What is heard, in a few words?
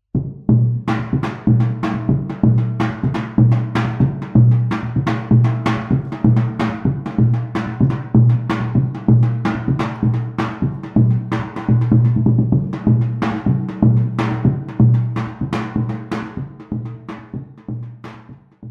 Zabumba